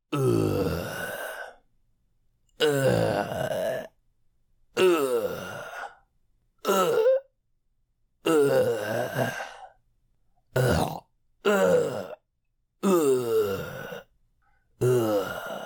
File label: Various Man Dying Grunts